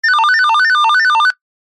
technology CB radio call ring tone

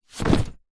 foley suitcase large heavy set down

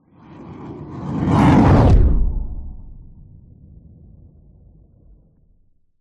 nature fireball pass by designed

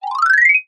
cartoon ascend climb fast mallets

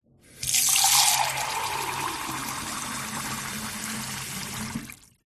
household kitchen mug fill water from tap

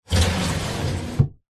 foley wooden box crate lid slide close fast

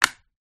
Light Switch

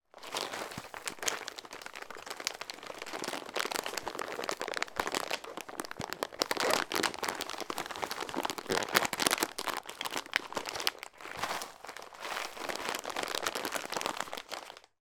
food snack mix pack movement long; zapsplat

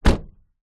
car door close external toyota corolla; transport

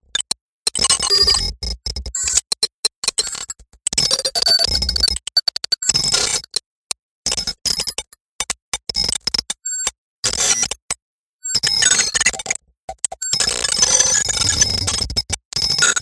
sound design digital glitches; Audio Mangler

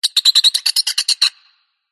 squeaky metal Designed Alien Bird; PMSFX

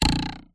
cartoon twang spring wooden ruler

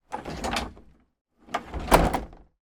industrial wheelie bin lid open close; sound effects one

